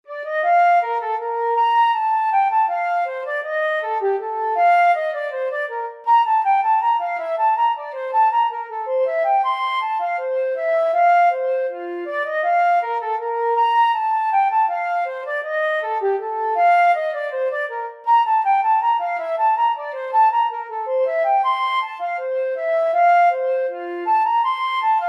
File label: bourree, flute